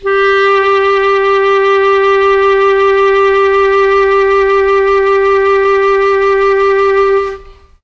G3 clarinet, mellotron; CHM CLARINET

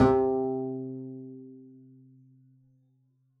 pizz c3, double bass

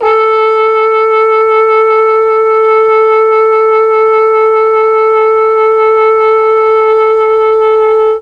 A3 TROMBONE, mellotron; CHMB TRMBONE

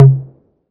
tom mid, drum machines, 808; 808-mini

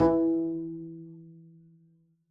pizz e3, double bass

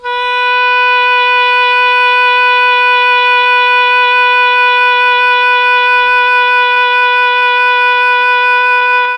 B2 OBOE, mellotron; CHMBLN OBOE

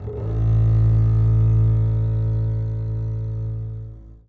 a1 down, bass arco; meatbass

